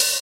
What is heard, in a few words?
Clear Open Hat One Shot F Key, Royality free open hat one shot tuned to the F note